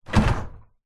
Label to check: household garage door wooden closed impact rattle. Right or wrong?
Right